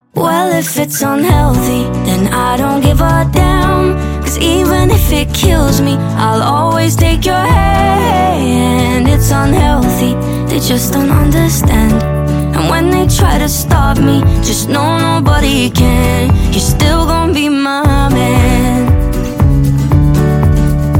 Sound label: unhealthy acoustic